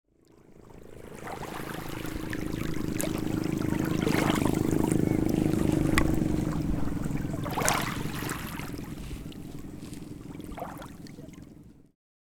Motorboat passing slowly gentle waves breaking on a beach